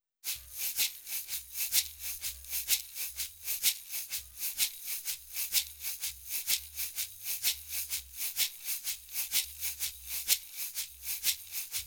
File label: shaker; Daking; Fet II 33609